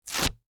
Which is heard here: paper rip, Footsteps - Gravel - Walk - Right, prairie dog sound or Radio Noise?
paper rip